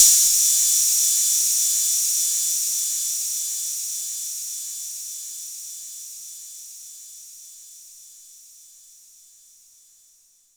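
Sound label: 606 HiHat Open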